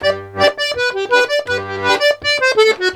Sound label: Accordions; USB Soundscan vol.40; AKAI